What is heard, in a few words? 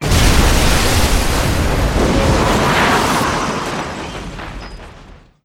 explosion big; gfuel